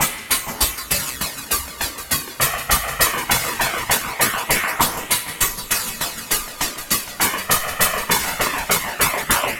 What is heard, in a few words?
8 Bit Beat, Modular Electronica; Sonic Foundry (Sony Creative Software)